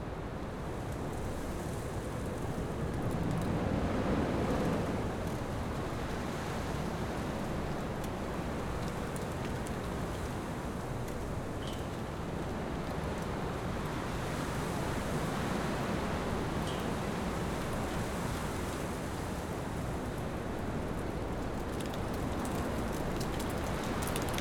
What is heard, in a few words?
Wind, Street, Rushing, Strong, Wet, Trees, Whoosh, Dead leaves, Metal Clatter; toneglowlibraries; Town Winds